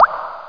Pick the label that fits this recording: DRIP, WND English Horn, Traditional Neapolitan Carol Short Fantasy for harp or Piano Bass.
DRIP